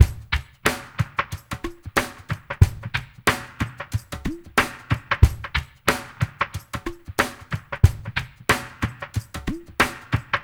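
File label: drum mix right; Spectrasonics; Liquid Grooves 1 & 2; CAVERN SW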